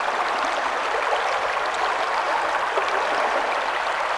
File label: water river